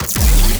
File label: Status Ability Cast; Warframe; Warframes; Helminth Abilities